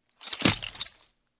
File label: Dropping object with tinkles